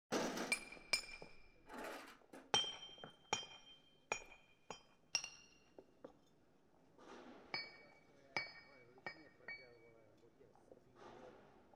Workers Mend Cobblestone Street

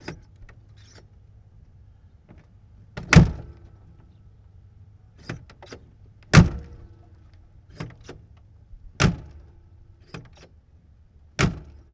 1954 Big Rig Door Open Close Various; Period Vehicles

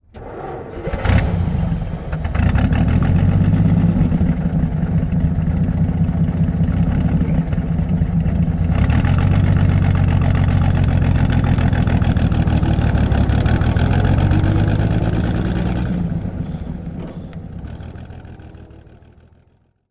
Plymouth Int Start Idle Away, Period Vehicles

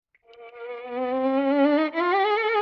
Violin Glissando Slide; Vintage Cartoon; Musical Elements

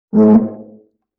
Viola String Finger Rub; Vintage Cartoon; Musical Elements

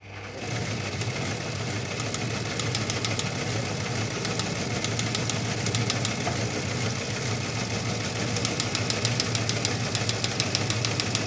Teletype Machine; Period Devices

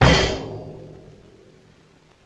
Bass Drum Cymbal Hit

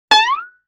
Violin Pluck Slide; Vintage Cartoon; Musical Elements

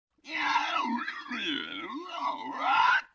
LoFi Synthetic Bird Caw; Vintage Cartoon; Comedy Vocals

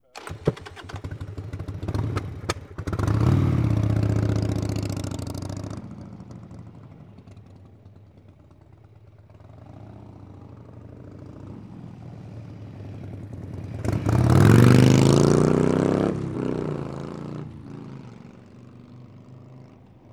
Motorcycle Start Away By; Bikes & Motorcycles; Vehicles

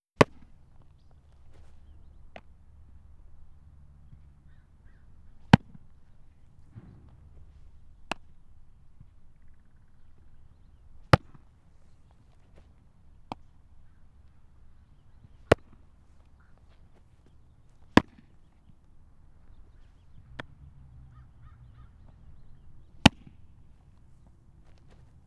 Baseball Game Of Catch; Sports & Recreation

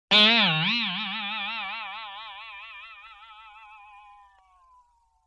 Boing Box

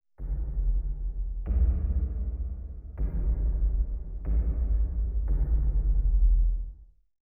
Sub Sonic Stinger; Title Sequence Effects

